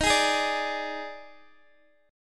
D sharp Major 7th Suspended 4th, Guitar DADGAD

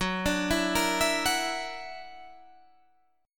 Gb Augmented 7th Gb

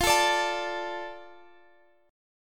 F 6th F down Guitar Standard; strummed; capo 9

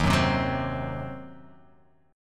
Eb Minor 6th, Guitar, Open F; strummed

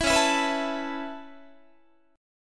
C sharp Minor 7th Sharp 5th C sharp Guitar; C#m7#5; strummed; JGuitar

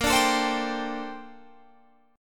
A sharp Major 13th A sharp; strummed

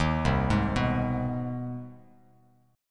C Minor Major 7th Sharp 5th, Bass Standard; CmM7#5 arpeggiated; JGuitar

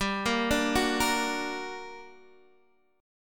G Minor Major 7th, down, Guitar, Open D

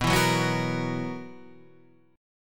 B Major 7th Suspended 4th B down Guitar Standard; BM7sus4; strummed

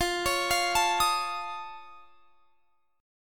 F Augmented 7th F, chord; F+7 arpeggiated; x,20,23,22,22,23

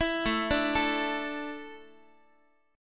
A Suspended 4th down Guitar; Asus4; arpeggiated; x,x,7,7,6,8; 4A,4E,5A,5D,5G#,6C#

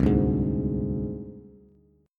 C Suspended 2nd Sharp 5th, down, Bass; Csus2#5 strummed; JGuitar

